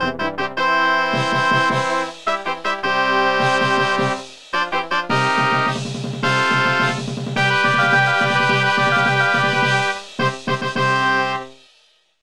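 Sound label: fanfare long; High Sea Saga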